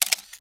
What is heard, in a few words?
Camera Capture; Skype Sounds